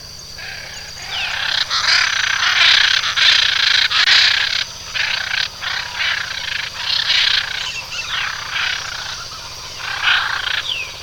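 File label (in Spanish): guacazul, Guacamayo Jacinto, Anodorhynchus hyacinthinus, Hyacinth Macaw; cantos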